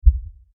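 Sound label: Impact Low Frequency Effects Hit; Lfe; Hits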